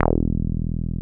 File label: 303 E2 mp; Drums In Your FACE!